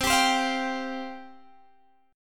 C Suspended 4th C Guitar Open G; down; chord shape

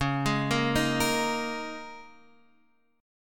Eb 7th Suspended 4th Db

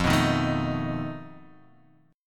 F 7th Flat 5th F; x,x,3,4,3,4,5,x

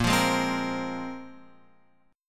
A sharp 7th Suspended 2nd, Guitar, Open F; strummed; x,5,5,3,3,5; chord; JGuitar

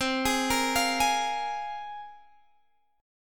C 7th Suspended 4th Sharp 5th; C7sus4#5 arpeggiated

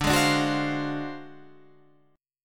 D 7th Sharp 9th Flat 5th, Guitar, Drop D

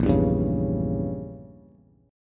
Bb Diminished 7th Bb Bass; strummed; JGuitar

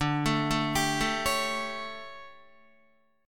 D Major 7th Suspended 4th, D, down, Guitar; arpeggiated

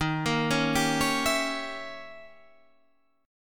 D sharp 7th Flat 9th, Guitar, Half Step Down; D#7b9

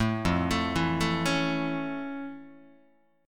F Augmented Major 7th, Guitar; arpeggiated; down; 4A,4E,5A,5D,5Gsharp,6Csharp

